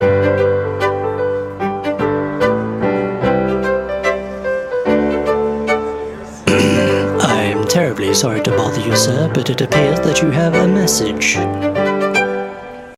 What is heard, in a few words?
Romantic